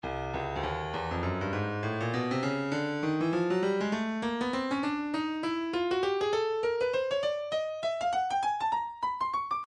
breakbeat midi 100 bpm; Stylus

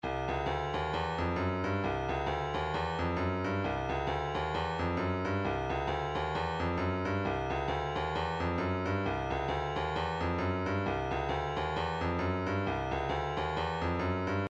shaker, midi

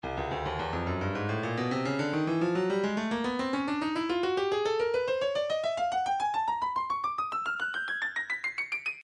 percussion midi files hi hats 107 hi hats perc; Stylus

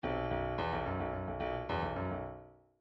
bdf midi file grooves funk b funk b

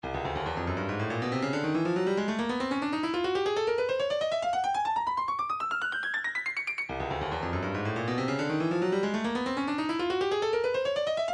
140 rain full mix, midi

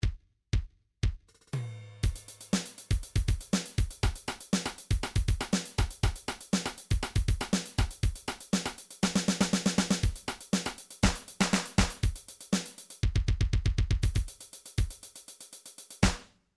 drums beatbox, midi drums

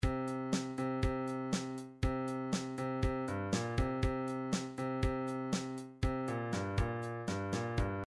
fill, midi

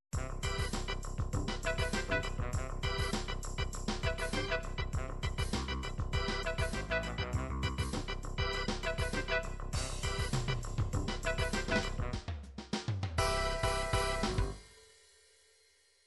r b brass

